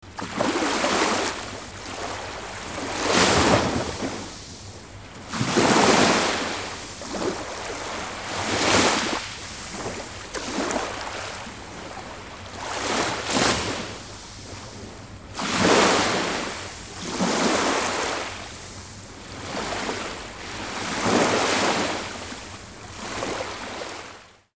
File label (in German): Meeresrauschen - Entspannung fuer Koerper und Geist